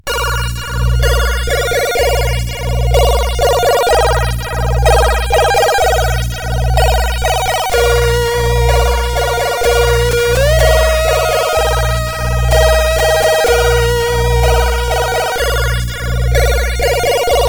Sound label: Sample, CSG8580R5; Sample V3